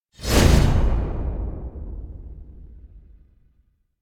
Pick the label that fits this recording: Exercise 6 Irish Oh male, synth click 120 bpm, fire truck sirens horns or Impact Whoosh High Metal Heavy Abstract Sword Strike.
Impact Whoosh High Metal Heavy Abstract Sword Strike